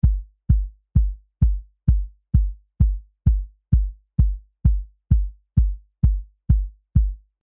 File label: Kick Sub; HALion